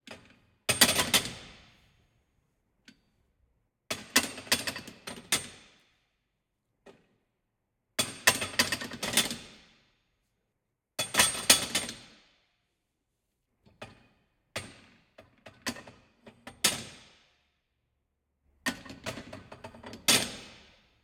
Gym, Foley, Barbell steel bar, place back in metal stand; Borg Sound; Gym weights